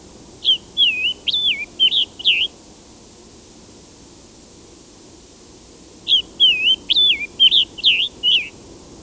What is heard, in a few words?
Scarlet Tanager; ibm glen